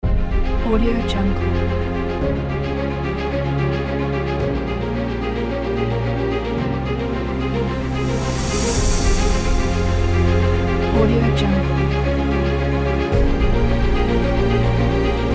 epic cinematic track

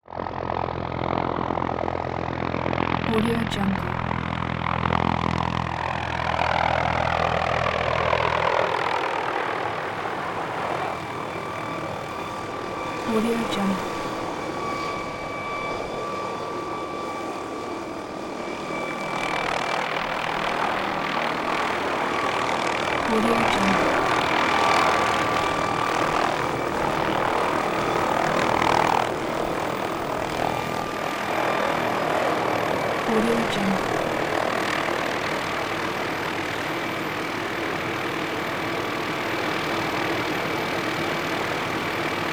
notar helicopter

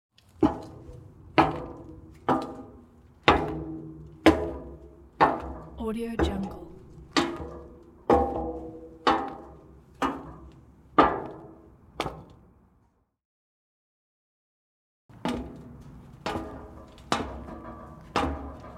footsteps on metal stairs

